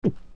Gulp; Adult Link; Link gulping a drink

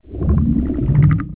Bubbles; Morpha; OOT

